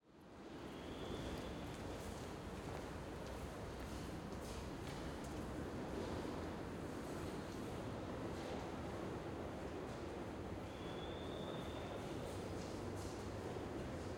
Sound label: BERLIN, PLATTFORM U-BAHN STATION KOTTBUSSER TOR, TRAIN STOPS MOVES ON, SIGNAL. VOICES, CAR; Creative Audio Pool; URBAN BERLIN VOL. 2; CAR HORN IN D